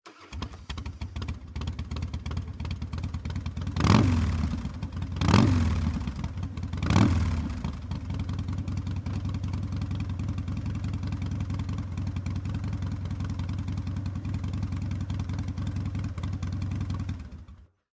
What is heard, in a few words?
harley start idle